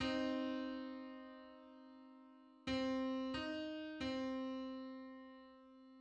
Pythagorean minor third on C

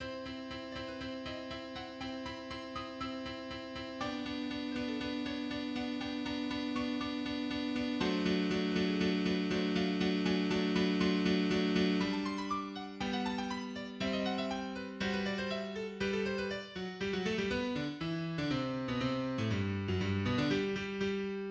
Sonata in C Major, recapitulation transition; Mozart; K. 309, I

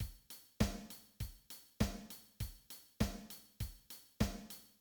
Four to the floor beat

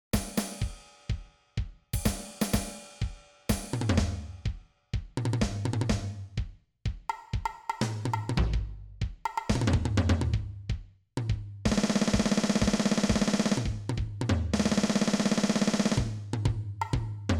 Drums

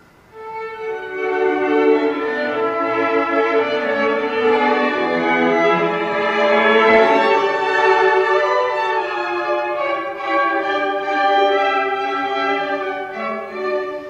Quartet Death and the Maiden Mvt 3 trio; Yorkside Quartet

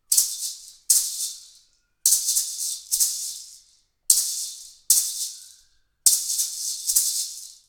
Maracas

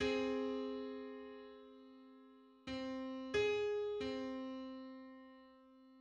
Minor sixth on C

